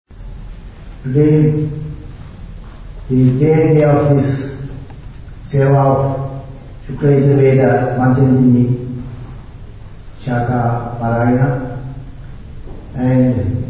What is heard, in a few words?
Vedic Chanting